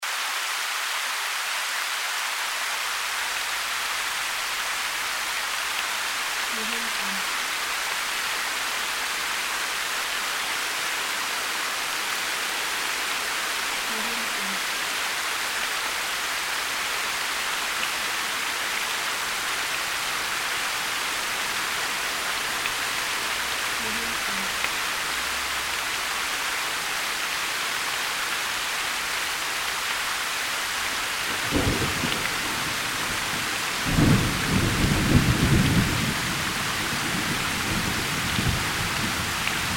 rain loop

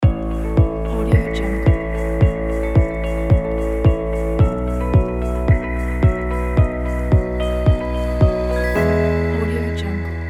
corporate logo

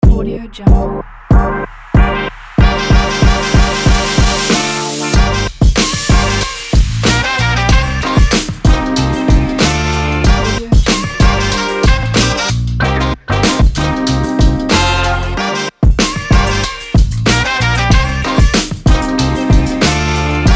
energetic upbeat hiphop

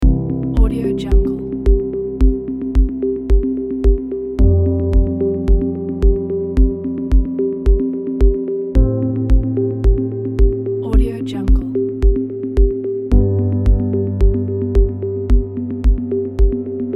atmospheric minimal background

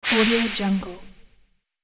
magic stun flash

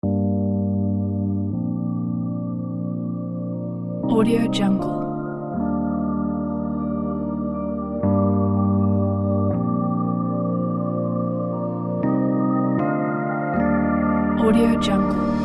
night desert kit

